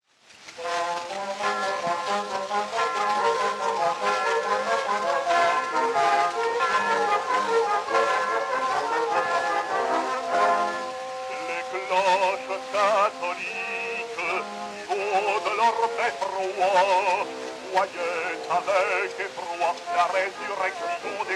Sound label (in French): les cloches; weber